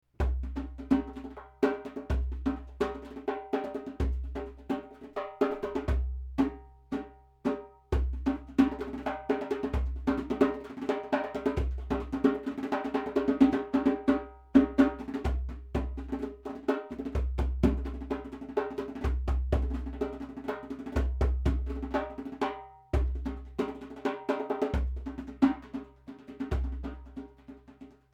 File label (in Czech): djembe laditelne se sklicky